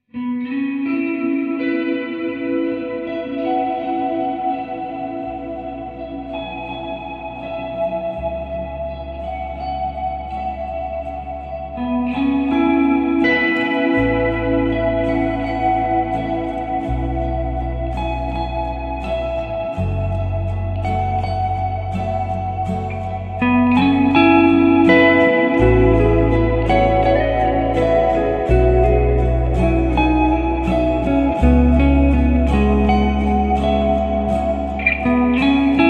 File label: sleepy